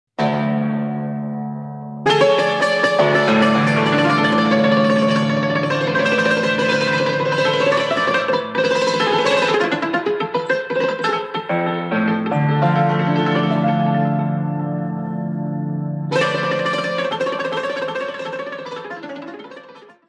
Old country hora, Roumanie

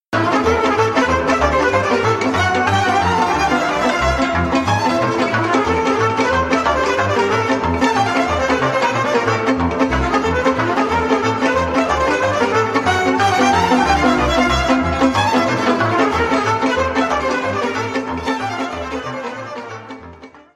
Sirba - Roumanie; Sirba de la Rediu

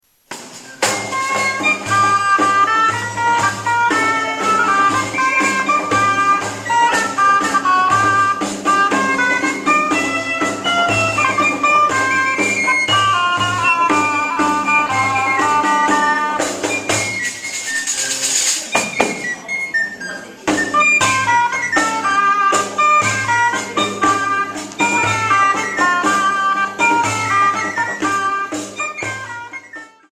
instruments cervelas

